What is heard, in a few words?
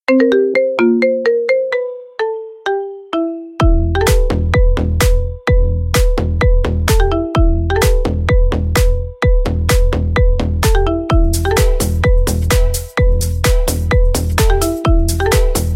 marimba remix